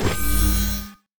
sniper light; LoL; lux

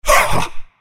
effort; Aatrox

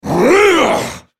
spell cast effort; Volibear; Character Voices - English

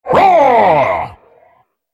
spell cast effort, en US

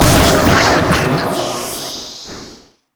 boom; spiritfire; LoL

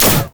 rumble shield

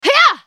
ultimate effort; Akali

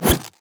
infected cleaver; mundo

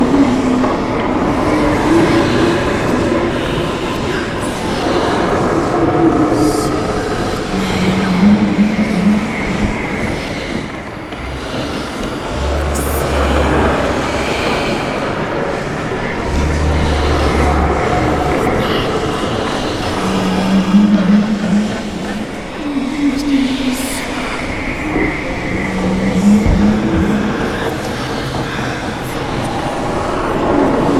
magic tree moans loop

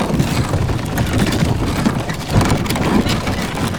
windmill main loop; LoL; emitters map8; odin

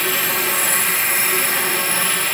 impale; LoL; skarner

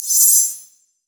burst of speed; LoL; fiora

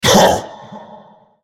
spell cast effort; nasusskin05; Character Voices - English

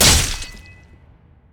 dragonstrike; LoL; jarvaniv

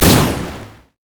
missile barrage normal; LoL; corki

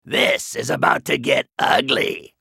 attack; Trundle

